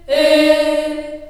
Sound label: D#3 CHS EH, CHILD EHS, Choir; USB Soundscan vol.28; Choir Acoustic & Synth; AKAI